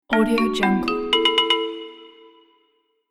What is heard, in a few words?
xylophone level up